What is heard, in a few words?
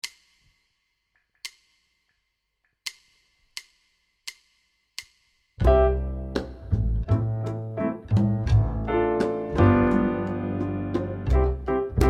C 85 harp